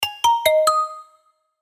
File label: Notification